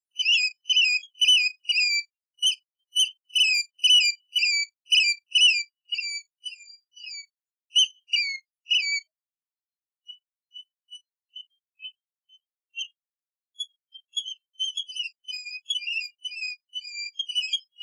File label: Sea Bird Isolated; Animals